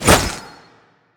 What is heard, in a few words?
fire; manticore; smite